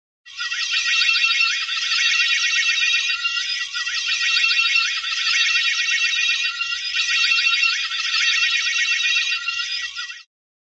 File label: sci fi light ray sword; cartoon